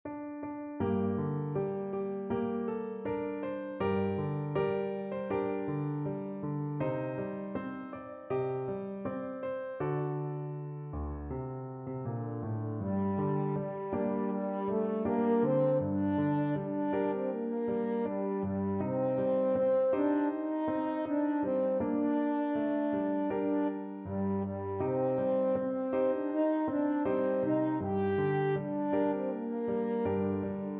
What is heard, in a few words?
au revoir camarade, french horn